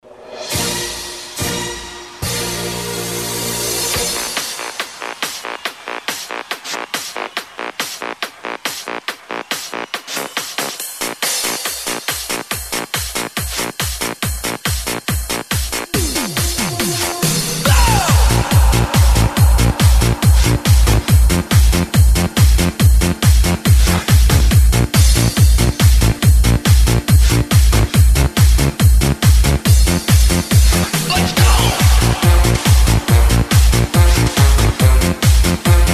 orchestral; classicos planeta ibiza vol 01 2014

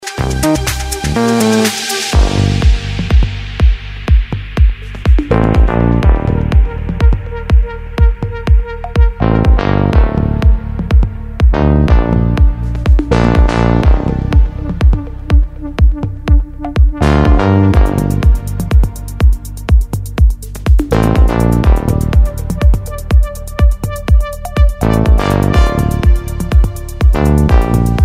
progressive melodic house

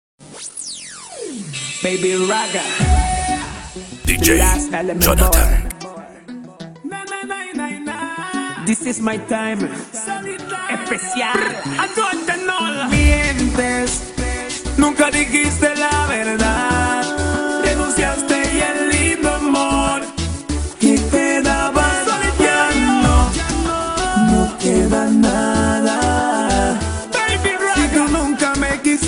reggaeton mix; reggaeton mix evolution

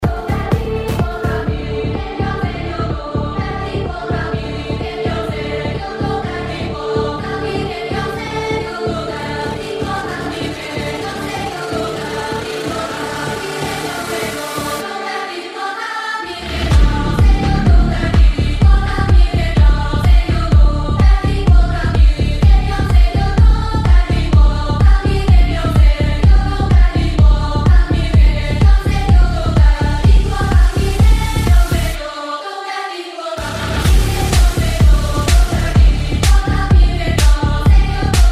progressive techno house

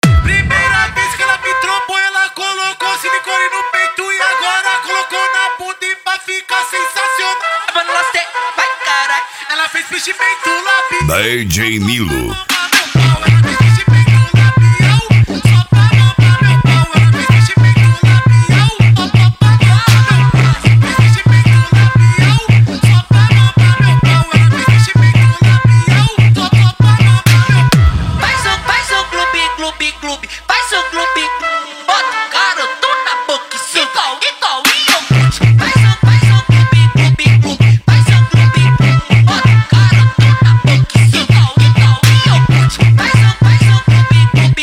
set funk bass

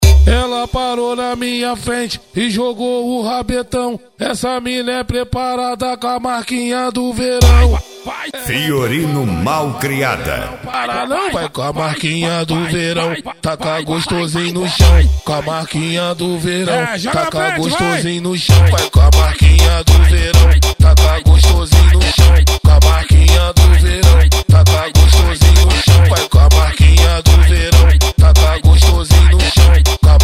mega funk bass

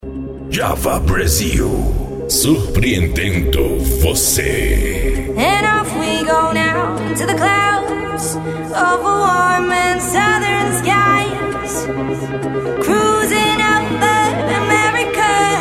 mega electro mix

